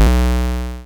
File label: item get